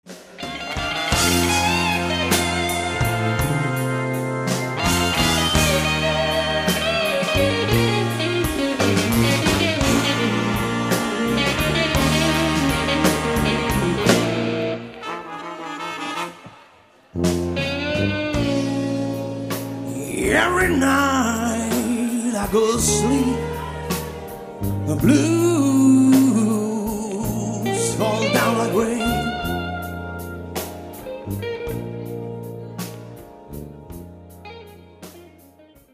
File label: mad guitar